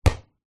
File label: sport soccer ball bounce hard ground